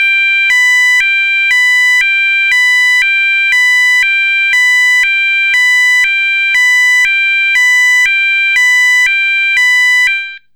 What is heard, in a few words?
Sounder